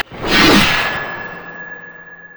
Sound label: quickdraw; LoL; yasuo base